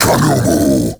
Cast Vocal; Warframe; Uriel; Demonium

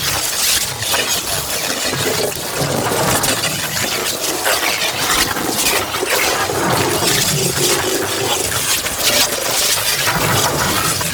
Rifle Alt Projectile Range Loop, Weapons; Warframe; Tenno; Gyre

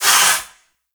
Forest Water Pump Engage; Props; Warframe; Grineer Forest

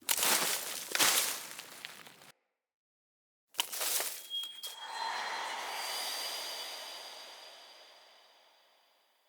Pickup Acorn; Cinematics; Bromius; Bromius Quest Acorn

